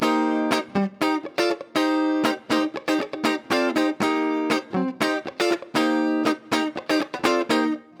Guitar 120bpm Cm, Nu Disco; Zero-G; Construction Kits; Legacy

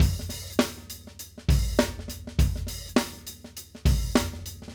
Acoustic Live Drum; Platinum Audiolab

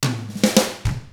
105 POP RK, Drums; ILIO; Double Platinum Drums 1

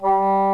WOODWINDS

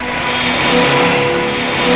WET, WATER; AKAI S6000 CD-ROM - Volume 1

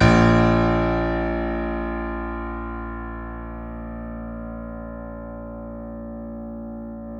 C7 F, The Ultimate Piano Collection; East West

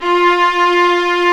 STR, Vlas Sect, Agitato

